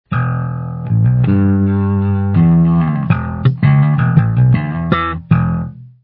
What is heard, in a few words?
bass distortion